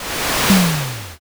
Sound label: fire magic